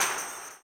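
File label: tambourine, 160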